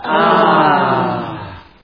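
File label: crowd admiration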